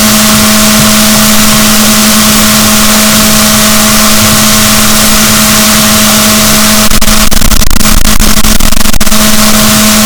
rorquals negatif, boat

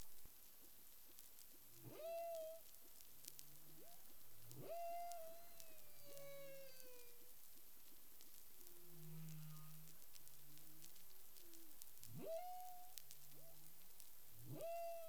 humpback whale; CARIMAM

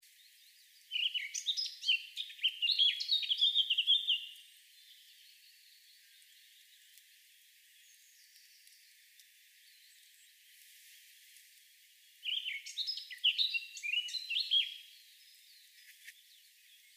Sylvia atricapilla; SETE

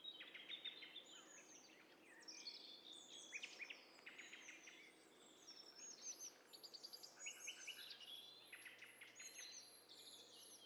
STEREO BIRD COCKTAIL PARTY

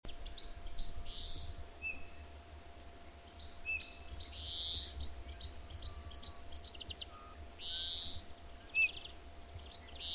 Pyrrhula pyrrhula; SETE

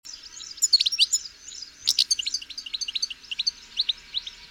Carduelis carduelis; SETE

